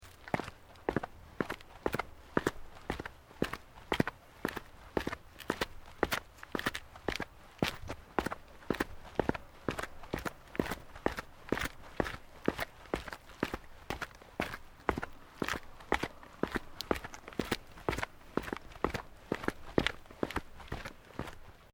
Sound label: FOOTSTEPS SNEAKERS STEPS CONCRETE DESCEND SEQUENCE; Footstep Foley; Sound Effects:Human